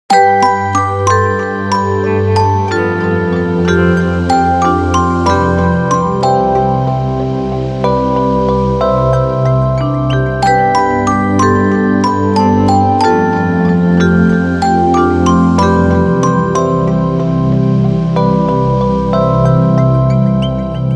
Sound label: Morning alarm, ringtones; Sony Ericsson; K770i